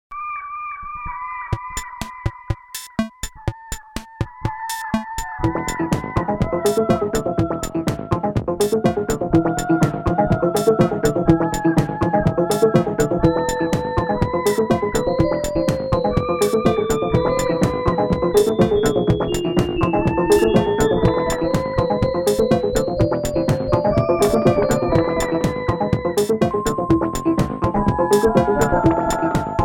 sk 1 trance casio

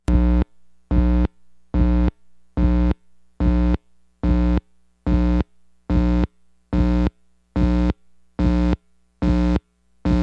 fun with noise

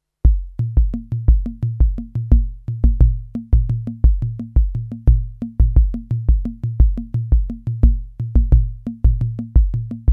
korg volca polyrhythm

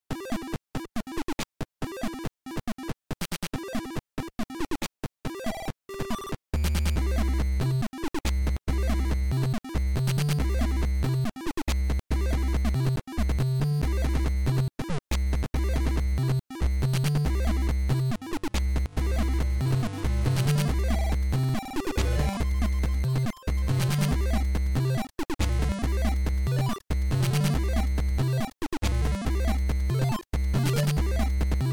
music only

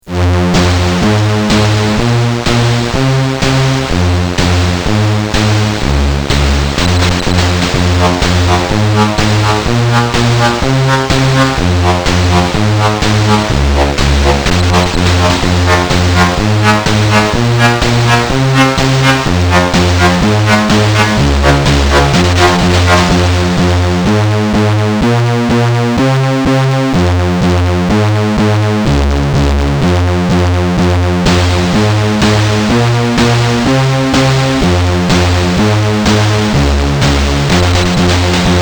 adlib

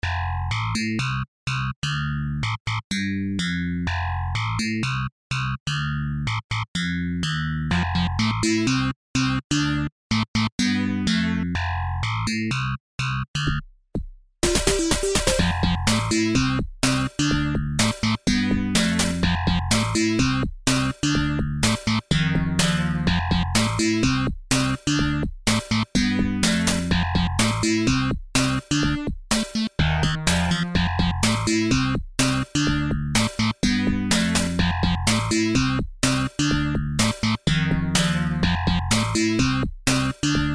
funky dream, fm

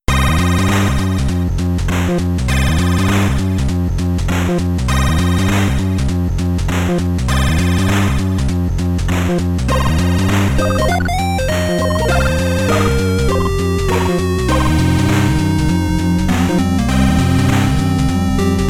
sewer crawl 2a03

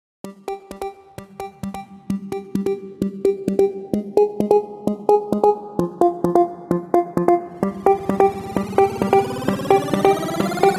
disco train acidnb remix; Donkey Kong

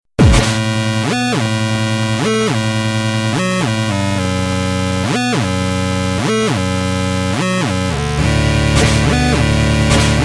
shotgun breakdown, lsdj

